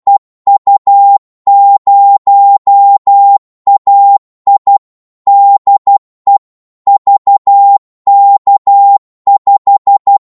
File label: qso 12wpm, Morse